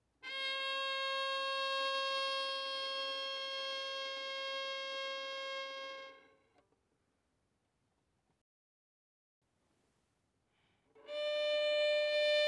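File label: Violin arco fortissimo on D string C5 D6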